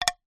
leisure draughts game piece place down onto board square